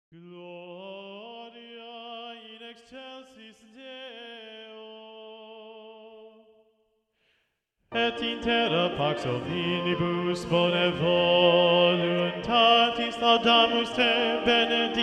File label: Bass Predominant; Haydn; Gloria original (Little Organ Mass); Choral Society of Greensboro